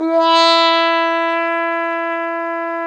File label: BRS TRUMPETS, Roland LA